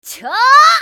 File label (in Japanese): 女･攻撃長; マスケッティア